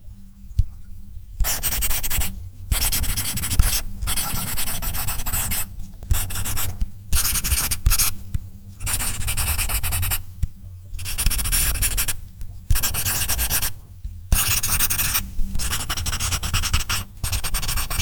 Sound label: the sound of a marker, drawing